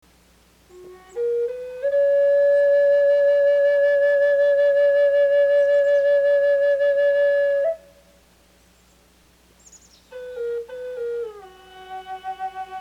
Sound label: Morning Flute